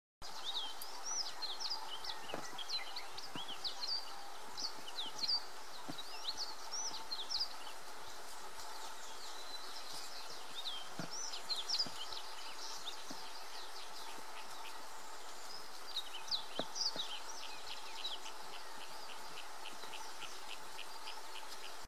canto do abelharuco de garganta branca

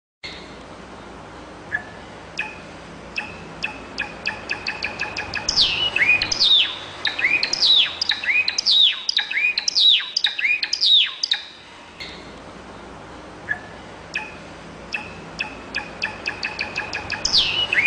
Canto do Calafate

